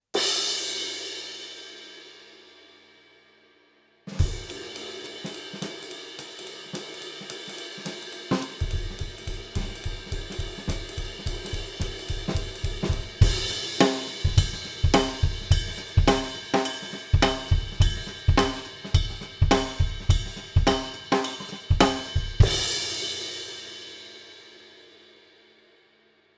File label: paiste 602